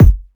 rich mid range steel kick drum sound F sharp key, bass drum one shot